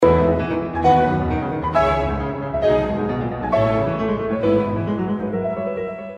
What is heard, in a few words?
piano concerto 23 in a 3rd mov allegro assai; موتسارت: موومان سوم کنسرتو پیانوی شماره ۲۳; mozart k488